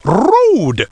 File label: Road; PUZMANIA